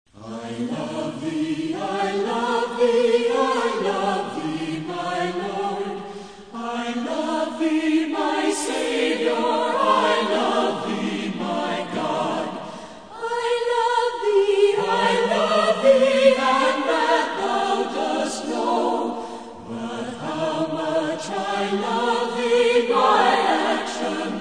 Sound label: Philharmonic Orchestra Choir, I Love Thee Take My Life and Let It Be